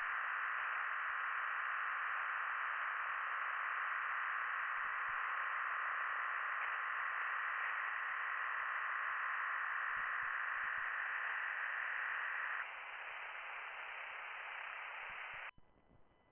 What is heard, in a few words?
from radio, 7177KHz